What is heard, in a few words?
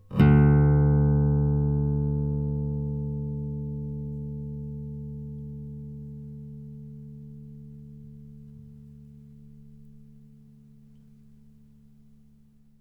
bass, ostinato bass; 6 bass